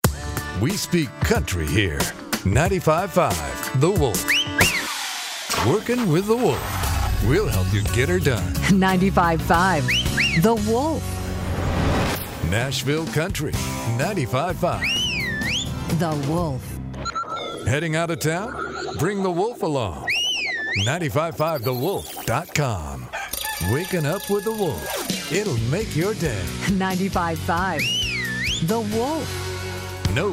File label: country